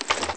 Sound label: spas12 reload done, weapons; sci arms